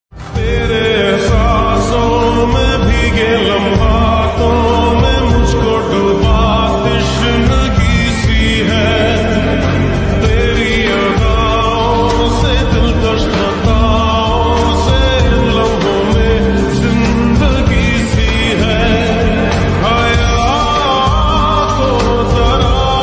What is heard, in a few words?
ringtone slowed reverb ringtone